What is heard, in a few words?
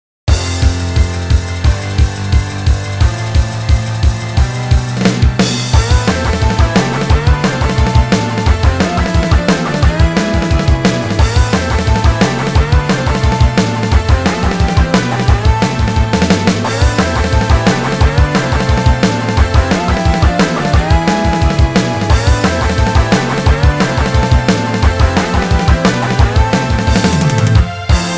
sound music raid; Dangeon Quest; android game music